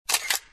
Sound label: camera click